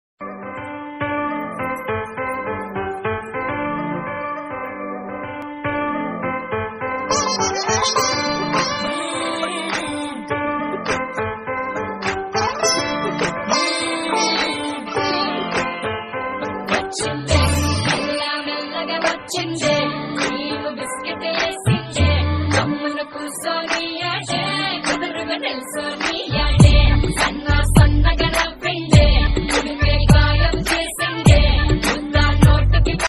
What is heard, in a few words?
song attitude ringtone bgm songs tamil; banumathi movie